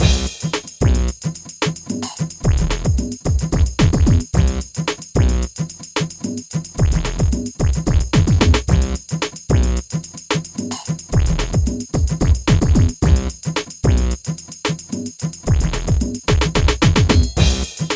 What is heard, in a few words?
BEAT, Beats 105-150bpm; PlatinumPhattCD1; E-MU Producer Series Vol. 8 – Platinum Phatt